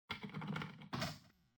door unlock; baldursgate

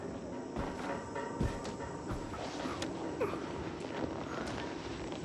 player character squeezing narrow corridor

